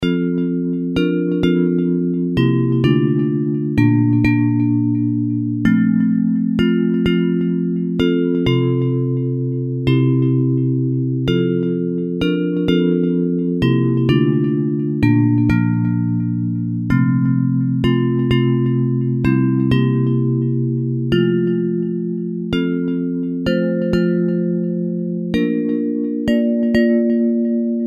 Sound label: Bells, Hymns